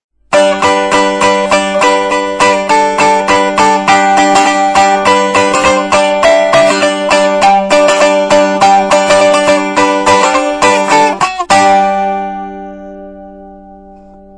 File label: strum; strumstick; jump